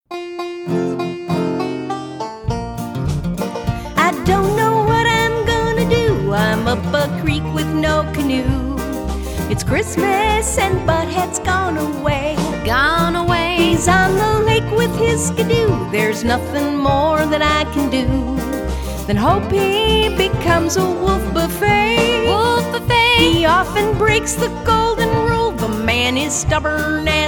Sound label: vocals